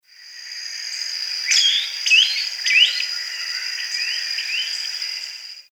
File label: Philydor atricapillum whistle